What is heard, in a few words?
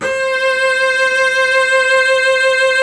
Virtuoso Strings, C4; ILIO; Kirk Hunter Virtuoso Strings VOL-2; 90 s Sample CDs